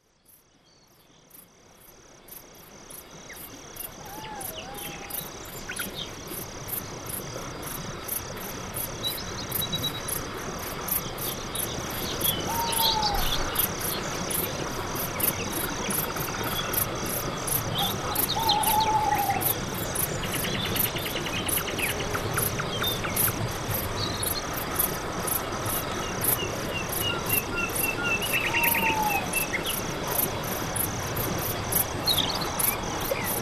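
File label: nochnoy les night forest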